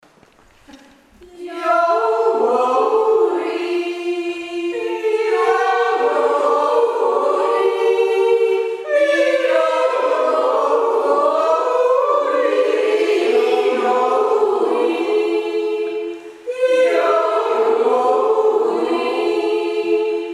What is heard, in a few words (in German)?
jodelwerkstatt